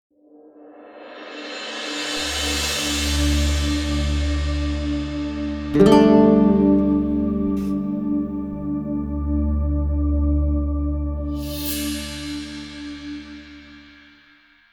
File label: Transition; Skellige Ard; Skellig To Settlements